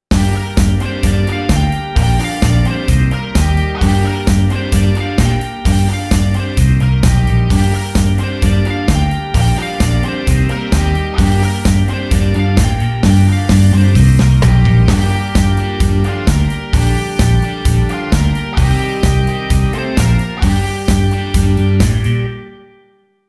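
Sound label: Pentatonic in Gm 130 Rock Straight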